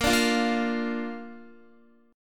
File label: A sharp Major, Guitar; strummed; tuning 4E,4A,5D,5G,5C,6F